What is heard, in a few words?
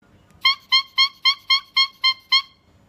Cockatiel honk